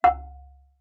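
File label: Marimba deadstroke fortissimo Db4; MIS Pitches - 2014; Percussion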